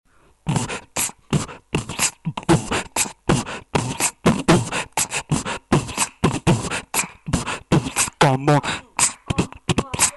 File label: beatbox